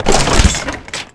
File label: bus hatch open; auto; Tony Hawk's Project 8; XBOX